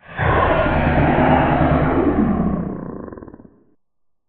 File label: death; Barotrauma; Barotraumatic; Abyssalcrawler